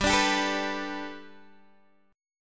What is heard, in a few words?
G Major Add 9th Guitar DADGAD, chord